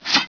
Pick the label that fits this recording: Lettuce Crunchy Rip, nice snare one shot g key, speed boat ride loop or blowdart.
blowdart